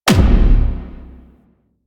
Concrete Archgun Punch; Warframe; Archwing

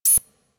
Mining Laser Gem Appear; Weapons; Eidolon Plains; Warframe; Eidolon Mining Lasers

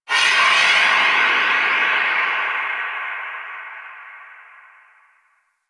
Town Steam Release, Ambience; Warframe; Duviri

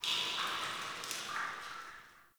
Under City Alley Water Drips

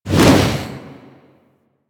Spear Throw Fire, Weapons; Warframe; Tenno; Priest Spear

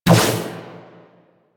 Guardian Shell Impact; Warframe; Focus; Vazarin

